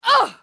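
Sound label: grunt, female, pain death; fmale; Soldier Of Fortune II Double Helix; XBOX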